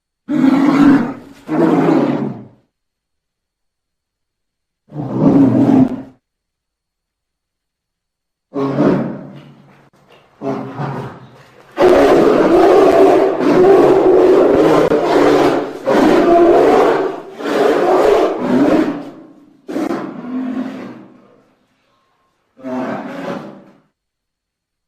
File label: Polar Bear